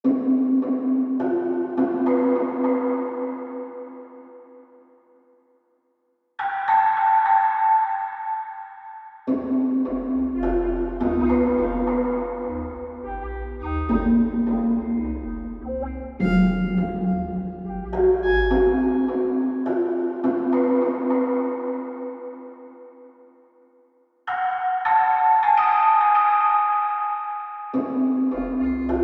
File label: Energy Music; beware